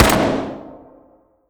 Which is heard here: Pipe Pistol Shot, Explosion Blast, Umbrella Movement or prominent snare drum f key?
Pipe Pistol Shot